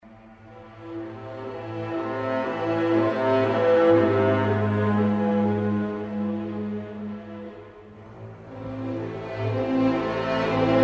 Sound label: Sound Track